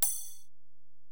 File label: Ride, Alesis DM-PRO, Drum Machines